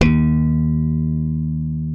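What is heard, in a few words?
left thumb D#2, slap thumb; Xsample VOL-1; 90 s Sample CDs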